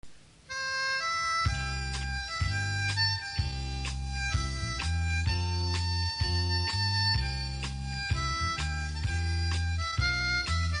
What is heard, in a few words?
michael row the boat ashore, harmonica